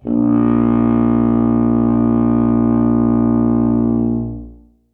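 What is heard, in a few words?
tuba sustain a#2; Sonatina Symphonic Orchestra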